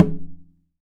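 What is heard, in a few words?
Percussion Conga; Drums; Acoustic and Electric; Polyend; Simulacrum Sounds; 1 Shots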